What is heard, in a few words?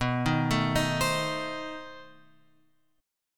B 7th Flat 9th B, guitar chord; B7b9 Chord {7 6 7 8 X 8} arpeggiated